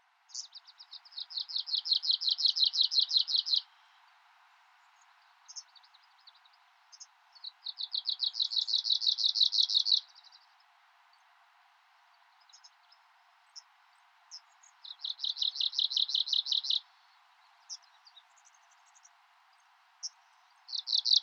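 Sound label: Arctic Warbler; koe; magadan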